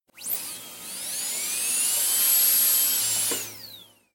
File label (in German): Zahnarztbohrer, schrecklicher Zahnarzt sound